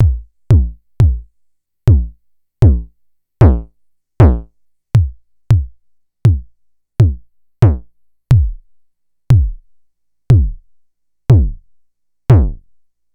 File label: Vermona DRM1-MK2 Kicks